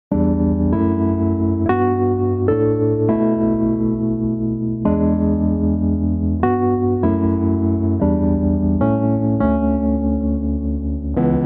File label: chords